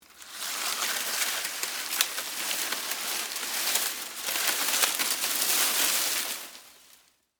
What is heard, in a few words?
Foliage Movement, Texture, Foliage, Movement, Palm, Frond; Rustling; BlastWave